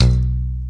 Electro FX; Ueb HE Electro FX

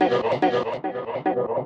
voxuguy, vocal or voxy loops

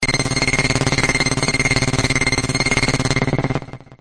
superstrummer harsh, synth

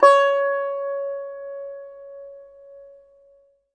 koto sounds

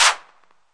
Korg KPR-77

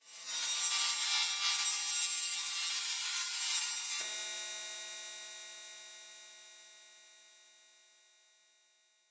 comb effects mid, Electro and Synthetic

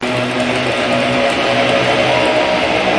flange noise strum thing, Guitars

